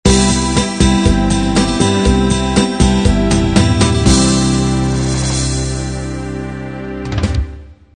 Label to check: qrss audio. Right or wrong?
Wrong